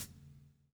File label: SLICK, Backbeat